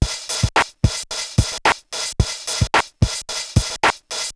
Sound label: Juno Filtered Korg MR-16 (110BPM), beats; musicradar; cyborg beats